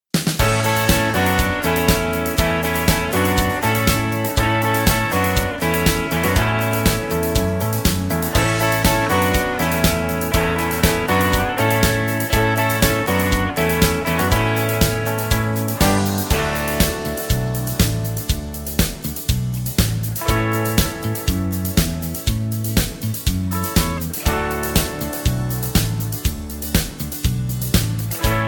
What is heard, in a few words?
A No Backing Vocals